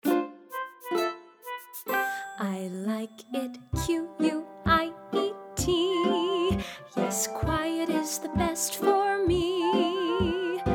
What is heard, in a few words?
vocal